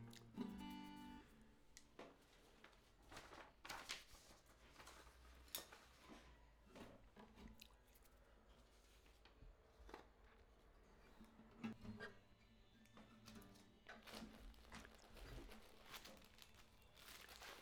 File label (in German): Nebengeraeusche